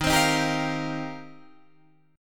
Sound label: D sharp 6th Add 9th, Guitar, Open D